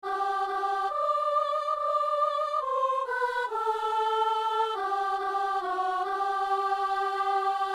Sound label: soprano; Notre Père et notre Dieu